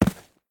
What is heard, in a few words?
Footstep; smite; raijin